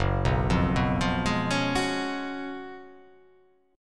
Gb Major 7th Suspended 2nd Suspended 4th Gb down, GbM7sus2sus4 chord; GbM7sus2sus4 arpeggiated; JGuitar